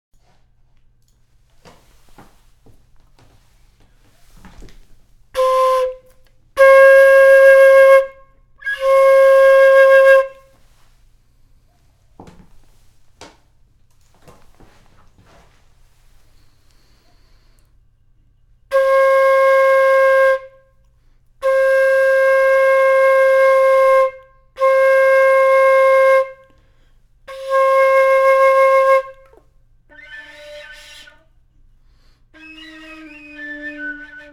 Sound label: flute